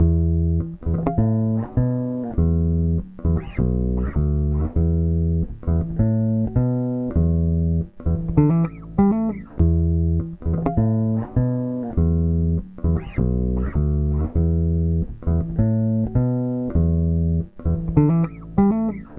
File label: Live Bass Guitar